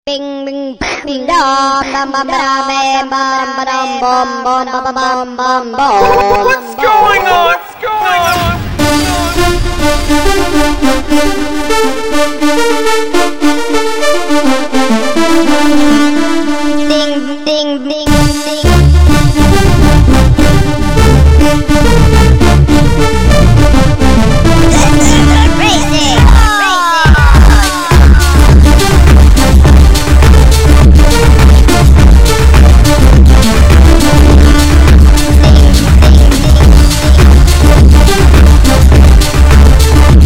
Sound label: reverb slow